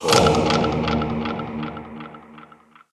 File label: Skulls; Puzzle Chronicles; PS3; PSP; X360; SFX SPELLS